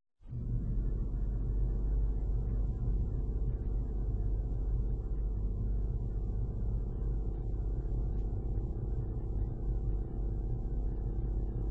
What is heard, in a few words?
timpani; western